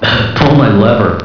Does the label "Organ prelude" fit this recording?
No